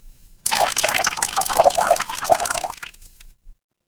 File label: water swirl